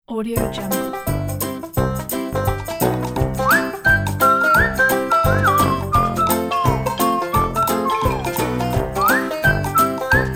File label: happy whistle